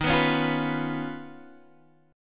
D sharp 7th Suspended 2nd Sharp 5th, Guitar, Standard; strummed; x,6,9,6,6,7; D#7sus2#5; JGuitar